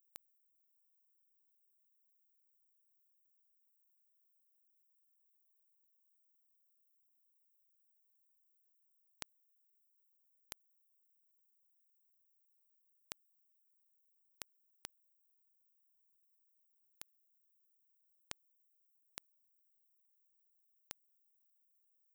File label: mirror music LOOP GAPLESSLY